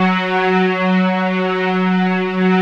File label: PAD, Vintage Voltage